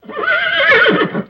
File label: Horse Whinny, Neigh, Surprised